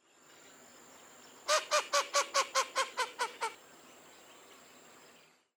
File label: Blue-winged Teal Spatula discors; waterfowl